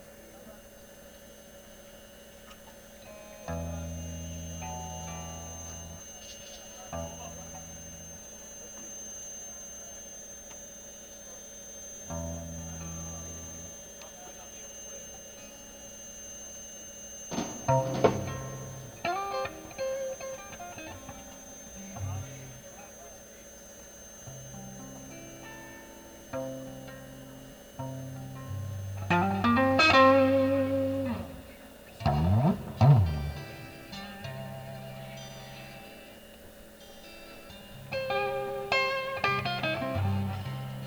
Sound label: Tuning